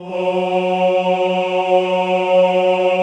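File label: choir F#2, Men-Combi; Sound & Vision - Gigapack I CD 2 (Roland)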